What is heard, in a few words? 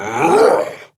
Punch, Barks; Kuva Lich; Persona A; Ability